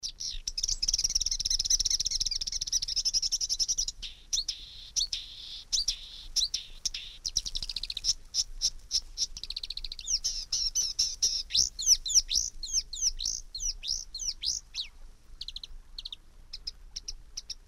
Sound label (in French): chant ac pa; Rousserolles et phragmites